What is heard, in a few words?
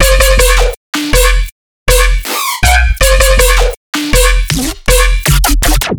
bass loop 160 E; Virtual Riot